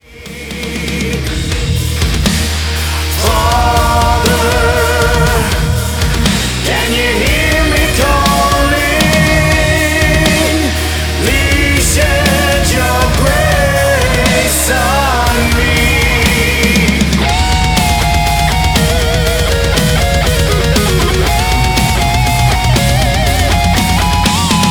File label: Battle Hymn